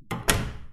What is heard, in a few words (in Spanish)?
puerta